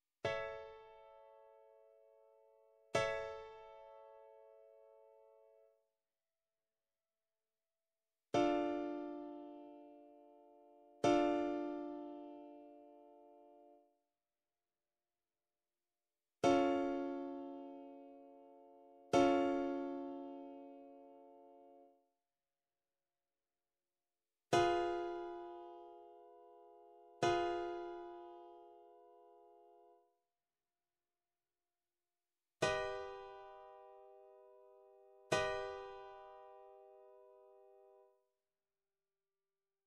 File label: Identify Major Minor Augmented Dim Triads; Grade 5